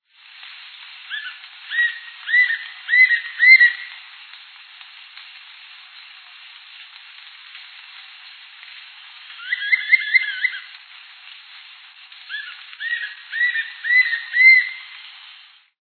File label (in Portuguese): capitao-de-saira-amarelo